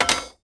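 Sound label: pipe drop creaky wood; objects; Star Wars Obi-Wan; XBOX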